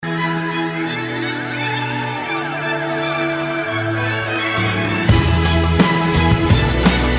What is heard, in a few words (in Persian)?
marsh